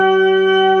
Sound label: B3 G#2, organ; VOCAL ORGAN